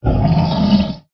animals bear growl; sound effects one

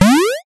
multimedia game bounce jump

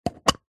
foley tin very small circular lid open; zapsplat

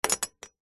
industrial tool metal spanner or similar set down; zapsplat

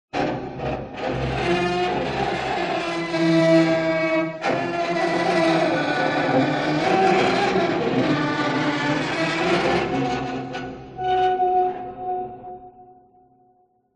horror accent metal scrape dark processed scary

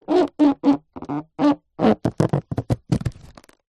leisure toy inflatable hammer rub squeak

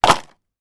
food drink ice cubes dump in cup hard; macdonalds

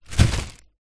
apples bag drop into cardboard box

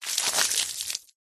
nature water pour onto concrete

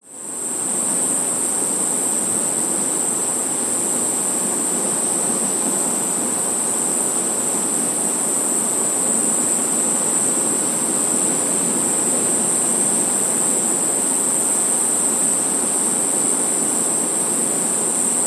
nature ocean waves surf distant cicada close by

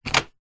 household door between garage and house open

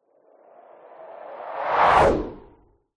fantasy magic wind whoosh spell; zapsplat

